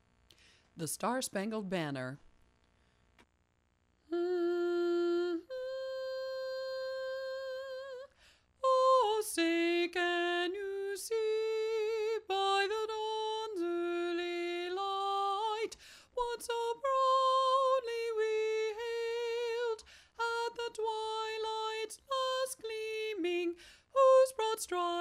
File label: tenor alone; SSB